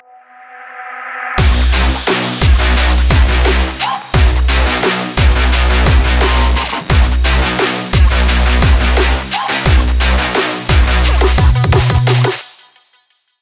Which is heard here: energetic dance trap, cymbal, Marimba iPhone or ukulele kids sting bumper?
energetic dance trap